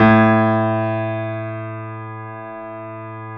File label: GRANDP ST A 1 F; USB Soundscan vol.17